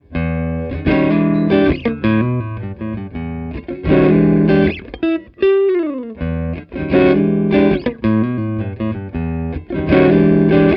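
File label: Multimic Blend Elec Gtr C414BXLS, electric guitar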